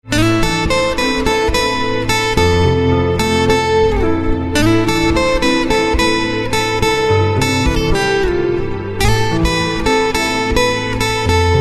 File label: Guitar Instrumental Ringtone